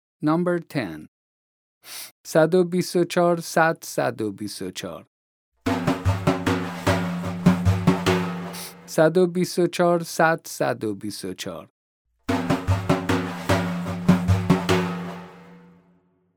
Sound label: vocalization and rhythm, Daf